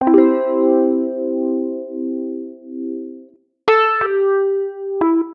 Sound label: E MK Piano; keys